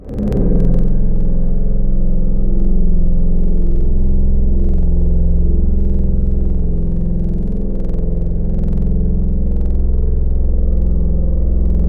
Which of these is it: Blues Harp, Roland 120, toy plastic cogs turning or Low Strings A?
Low Strings A